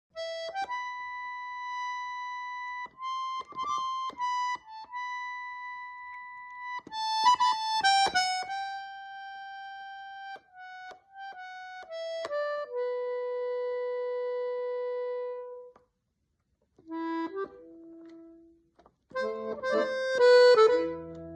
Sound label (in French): accordeon